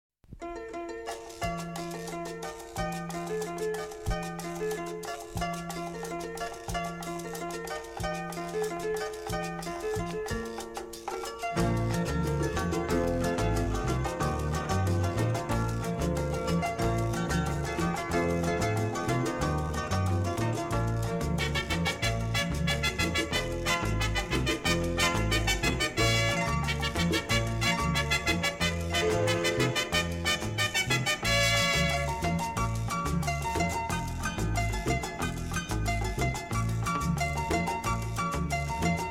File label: Harpa Paraguaya